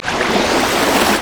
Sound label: loop; LoL; syndra